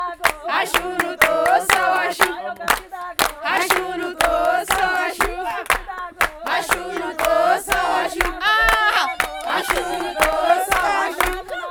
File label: spatial audio singing